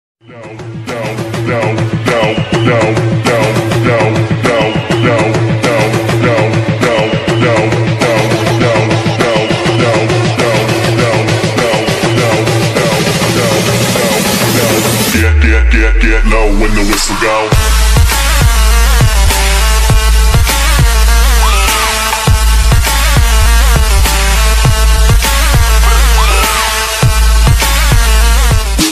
Remix Ringtone; IPL 2019

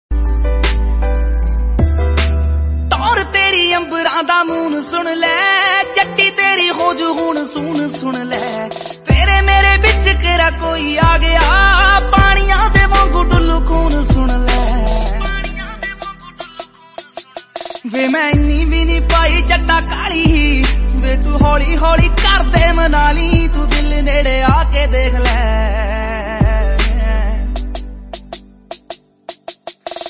Punjabi Song Ringtone

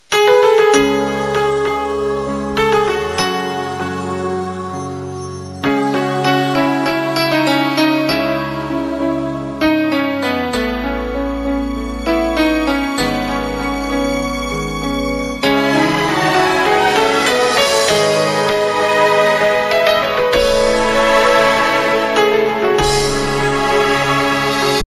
Romantic Love Ringtone

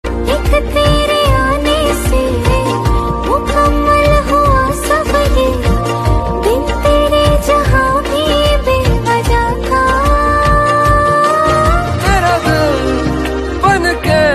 Love Song Female Version Ringtone

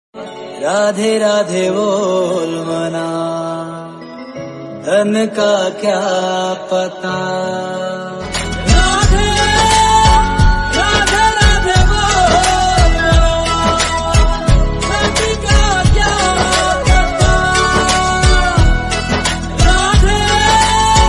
Bhajan Song Ringtone

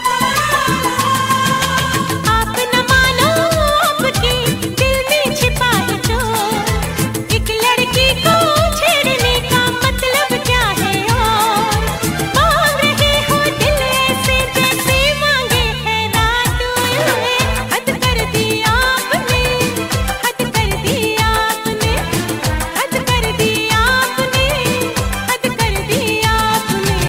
Old Ringtone

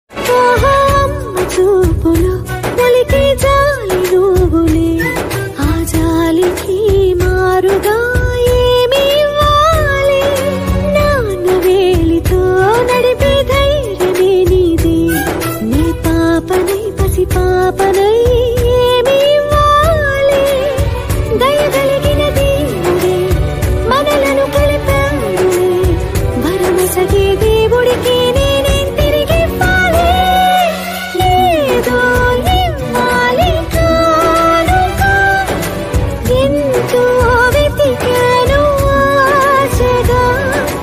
Female Version Ringtone

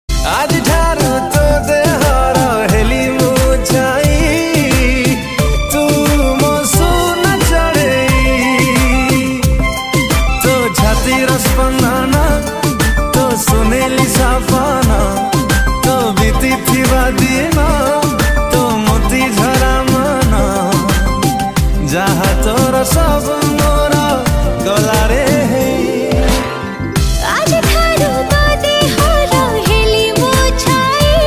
Odia Film Song

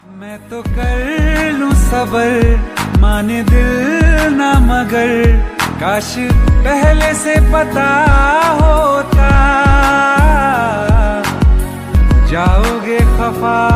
ringtones

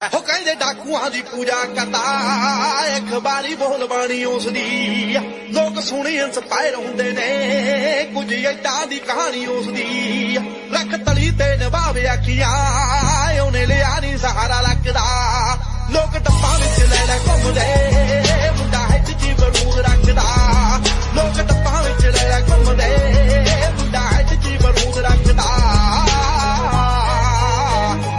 Punjabi Ringtone

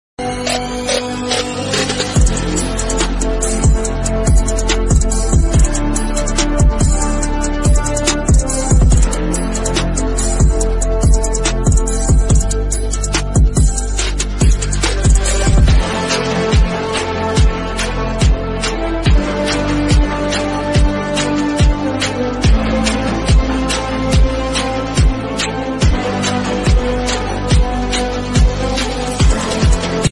English Song Bgm

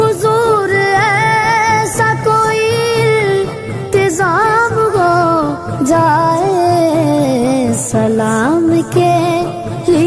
New Naat Ringtone

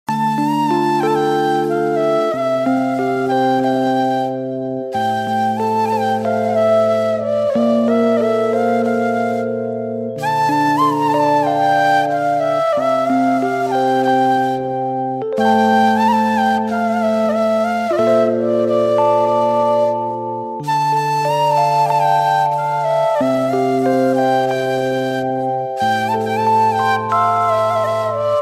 Flute Version Ringtone

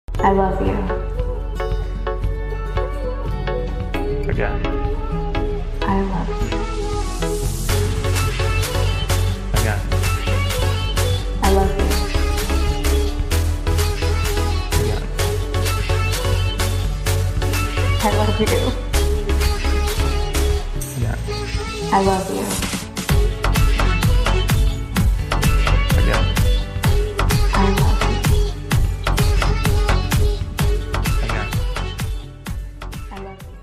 Romantic Ringtone

